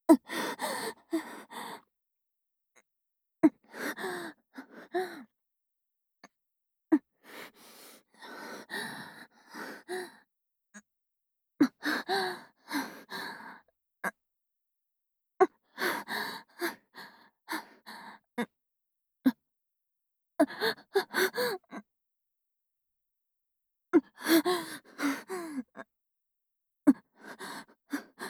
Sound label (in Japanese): 座位喘ぎ, ASMR